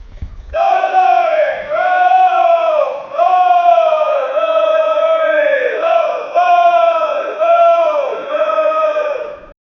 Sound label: men shouting; vocal